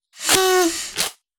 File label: party blower; taunt; terrytarget